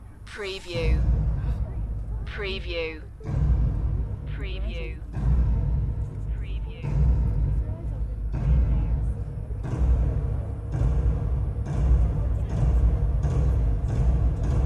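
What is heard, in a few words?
Fireworks Orchestra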